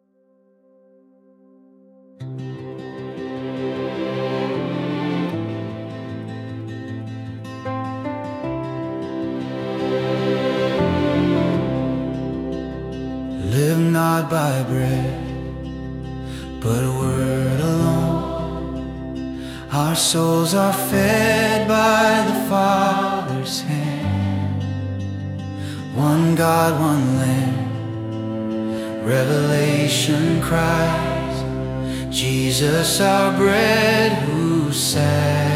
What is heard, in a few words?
Live not by bread with Refrain Acoustic